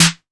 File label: 808 snare; SNARES; Wizoo - Platinum 24 Electronic